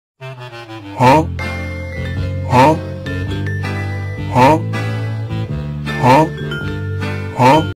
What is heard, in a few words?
huh happy music